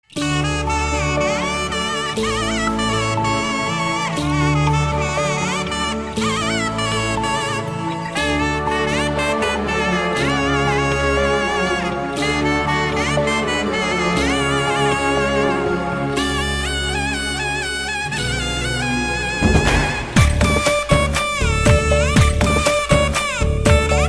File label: instrumental